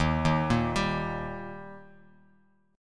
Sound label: D sharp Major D sharp Bass Standard, chord; arpeggiated; 11,6,8,12; JGuitar